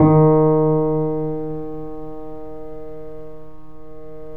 The Piano, D#; InVision Interactive - The Piano; BOESINGSOFT

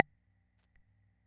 Keynote MR-101 Auto Rhythm Perc; Keynote MR-101 Auto Rhythm Sample Pack

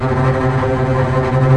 TREM STRINGS

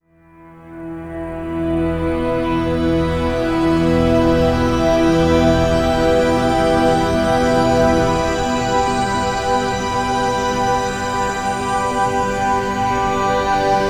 Long Hi Complex, Complex Wave

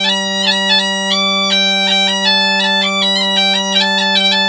G BP, Celtic Flavours, BAGPIP080; USB Soundscan vol.42; AKAI

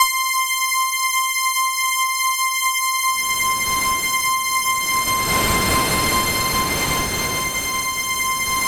C4, Synth Line; 90 s Sample CDs; NiceBeats; SynthLine VOL-14

